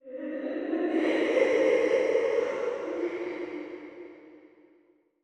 woman ghost cry

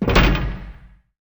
close glass cabinet

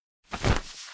atk flag

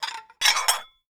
glass clink

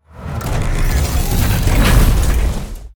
mech warrior

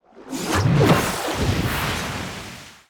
water sub break

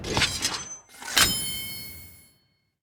counter heavy

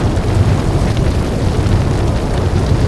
flamethrower loop

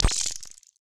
atk elec wand

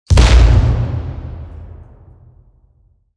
grenade explosion

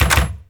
bow

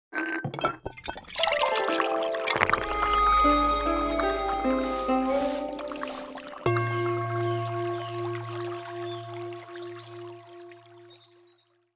jade Table begin

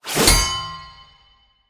sword chop shield

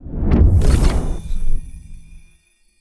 new record

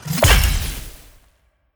p atk crochammer

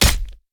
brandish sabre

